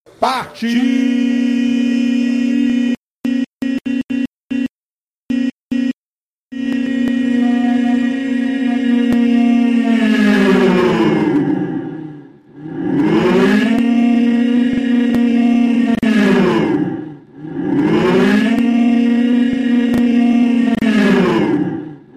buzina e sirene; sacani partiu

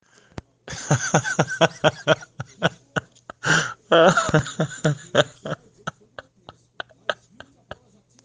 risada do gordinho

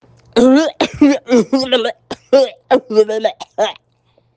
risada engasgada

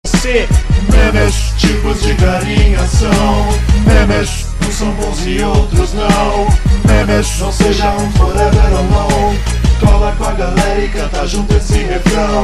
rap

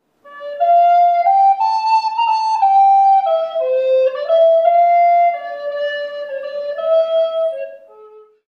flauta doce effect

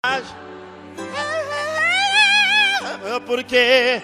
cantor gospel exaltado

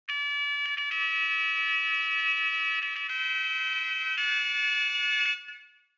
trompete

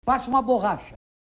torcedor avaiano

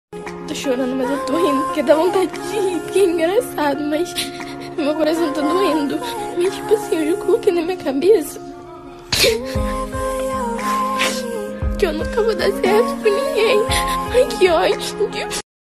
chorando

